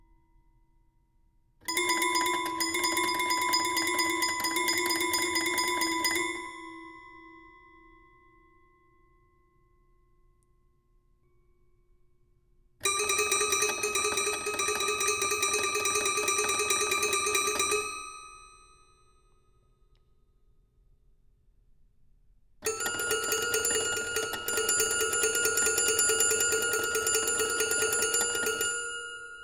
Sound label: trills